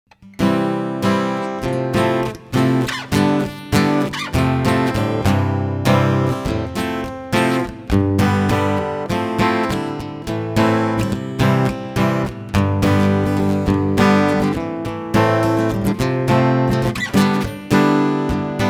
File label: Orange FX Pedal Acoustic